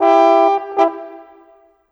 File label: Horns, Orchestral, Rock Pop; Sonic Foundry (Sony Creative Software); Orchestral Series VOL-4; Rock and Pop; Rock-Pop 19